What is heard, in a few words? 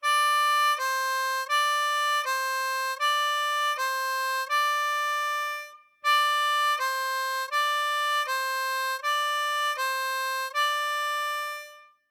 Single Note Exercise, Hole 4, blues harp